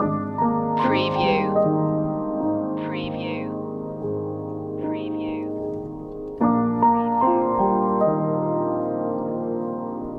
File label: piano only